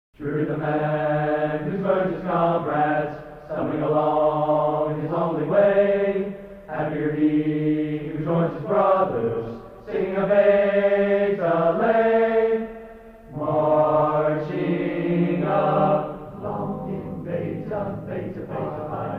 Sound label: Marching Along